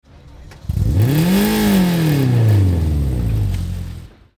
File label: BMW 318i